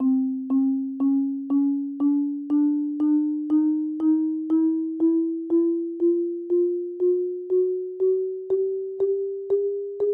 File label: 31 tone scale